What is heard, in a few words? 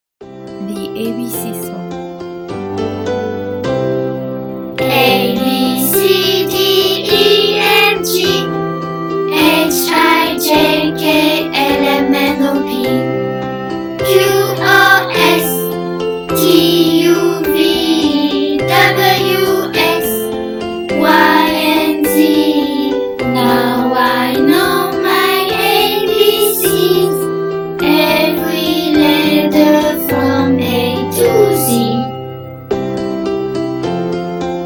the abc song in english la chanson de l'alphabet en anglais